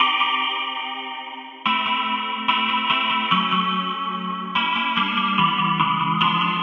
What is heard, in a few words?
072 moody-minir-synth-chords-melody, synth and electronic loops